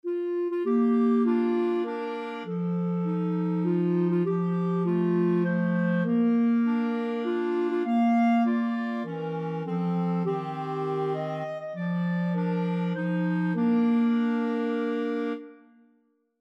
happy birthday, clarinet trio

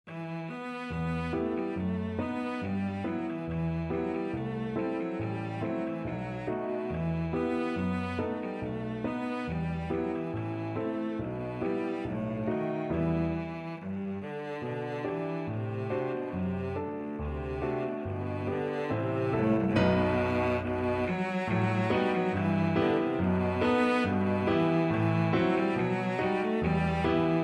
gavotte, cello

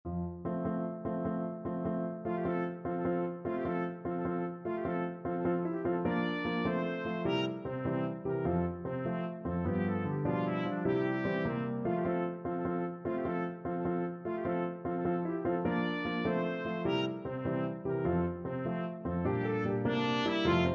trumpet

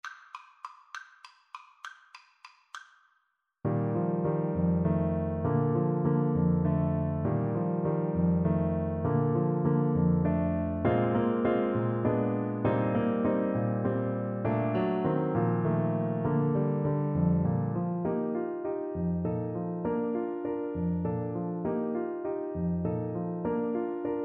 Clarinet

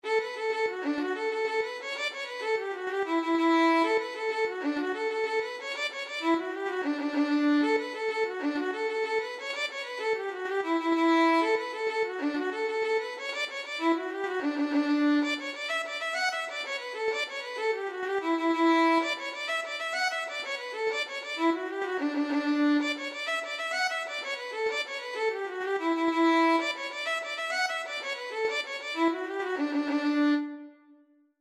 visit to ireland violin

